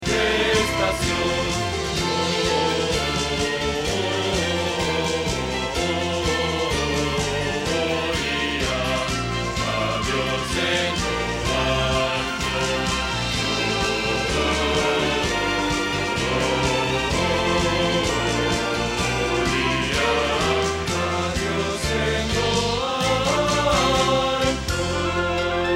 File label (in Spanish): Bajo